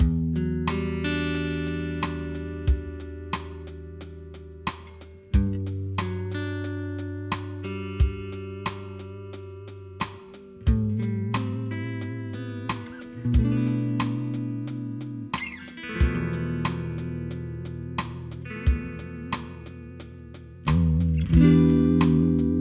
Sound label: vibrato clean; rotovibe